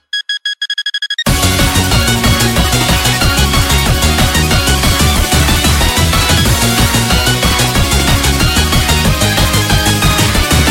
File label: game box